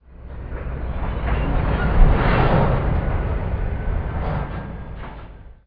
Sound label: train couple; SpecOps2 MPlayer Demo